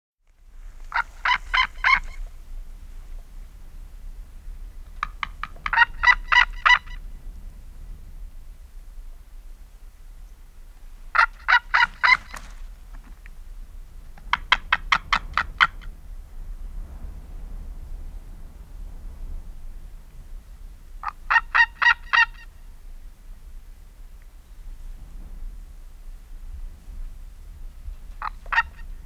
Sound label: White-tailed Eagle